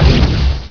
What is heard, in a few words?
canon